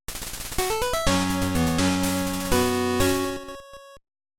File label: win jingle; CPA Skill Check